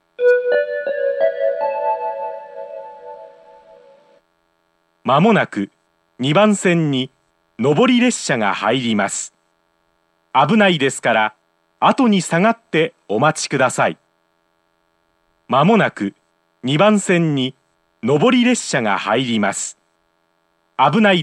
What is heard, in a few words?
yumoto 1 bannsenn sekkinn